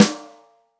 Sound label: ROCK SNARE CHROMATIC, Real Drum Kits